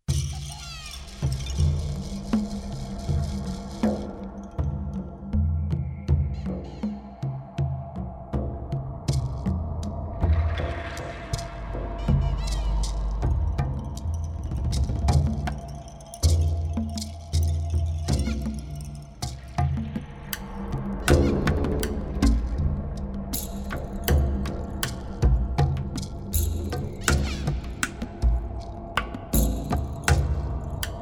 performance grooves 80 bpm; sorcerer hut

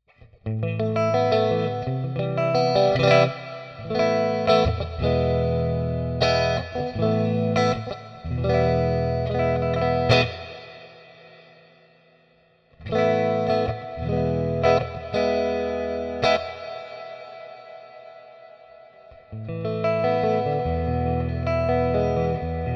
Spring Reverb

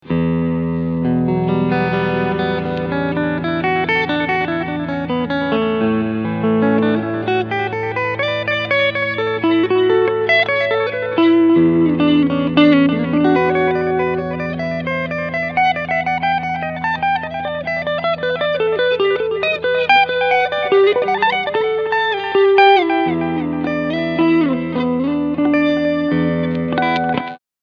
front clean

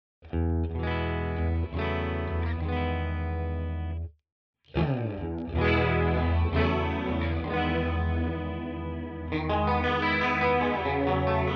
CHORUS Medium; Walrus Fundamental

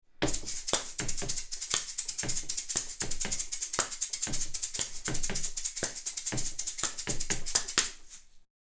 C414B ULS Beat

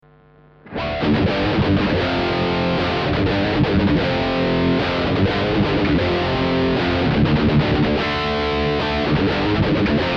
High Gain Les Paul Class AB direct out; MSDI

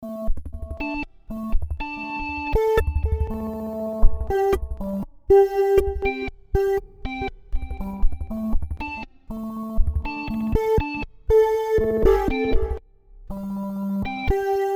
Snap Shot Sequencer; Waves Flow Motion

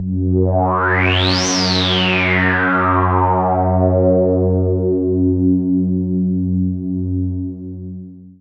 Minimoog Filter Rise

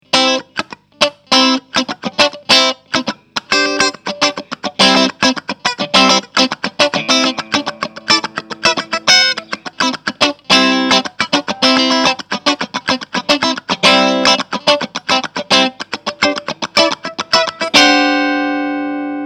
Peavey Rockmaster Clean Strat

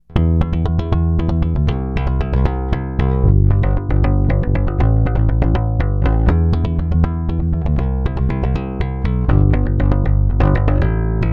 P Bass Finger Metal Line

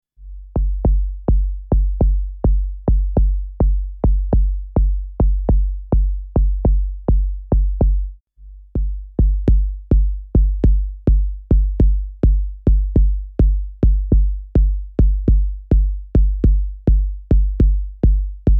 Kicks; DFAM vs Edge

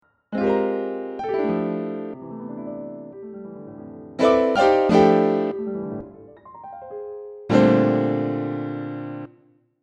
Chord Memory

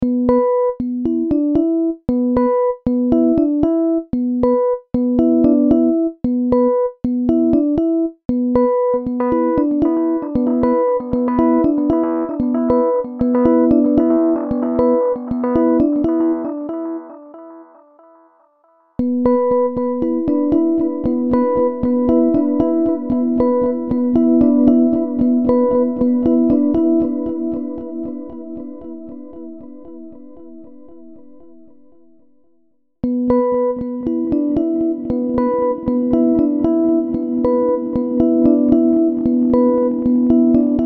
Delay first dry

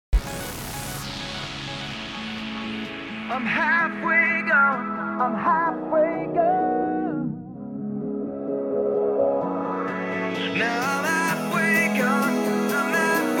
filter

